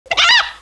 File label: chicken pain